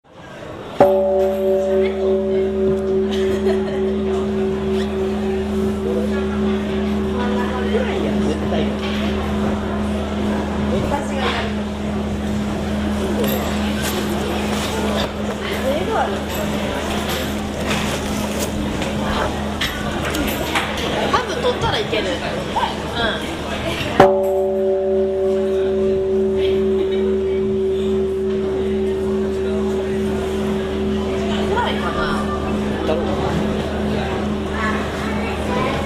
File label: takamatsu gong; japan